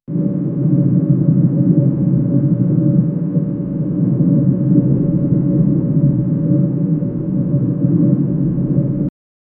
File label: ambience sound of insid, submarine